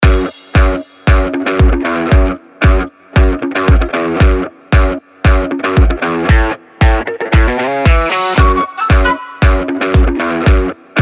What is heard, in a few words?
funk it